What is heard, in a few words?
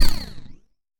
Stab